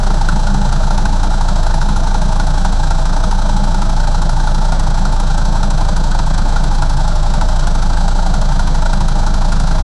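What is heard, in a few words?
high performance sports car, redline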